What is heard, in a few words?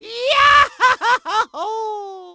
burned to death; Mario; Super Mario Sunshine